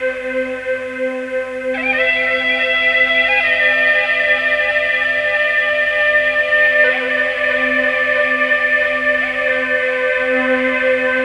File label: Flute Echo; Sound FX